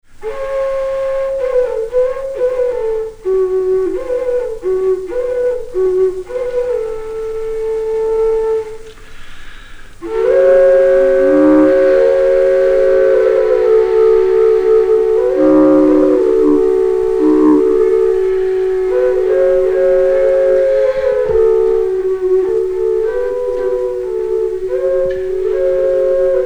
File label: Nose Flute Trio